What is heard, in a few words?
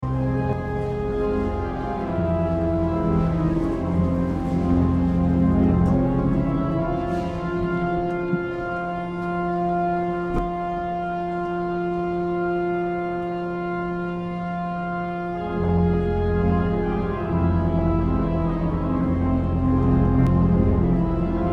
organ; germany berlin berliner dom